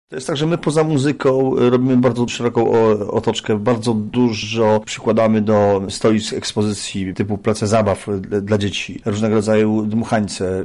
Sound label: Blues; Kraśnik Blues Meeting